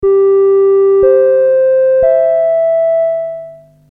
attention tone

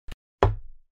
Put glass on table; گذاشتن لیوان روی میز

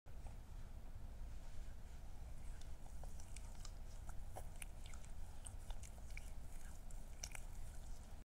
hand cream; کرم دست

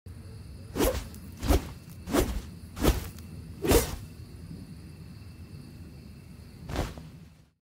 Katana; کاتانا

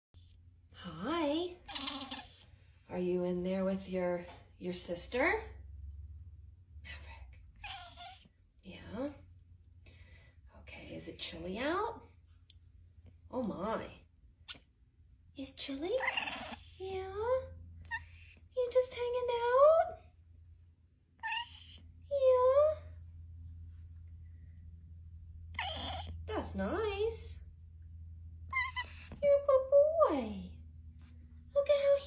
Kinkajou

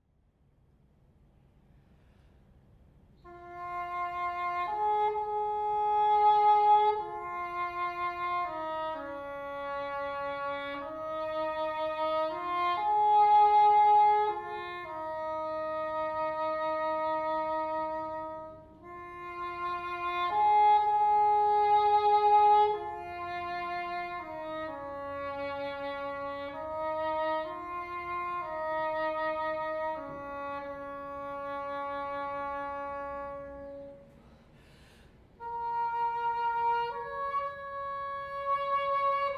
Cor Anglais; کر آنگله

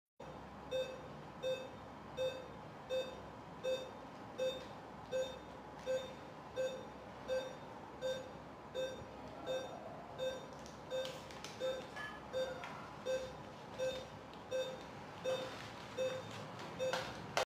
Operating room environment; محیط اتاق عمل

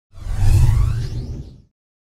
transition futuristic ufo

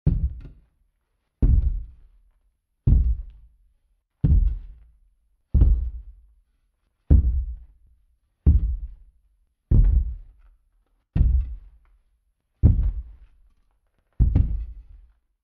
Walking Giant

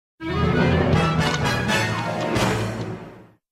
bugs bunny cartoon, موسیقی انیمیشن; باگز بانی

